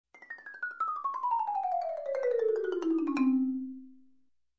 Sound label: Marimba Scale Down